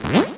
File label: Koopaling Jump; New Super Mario Bros. Wii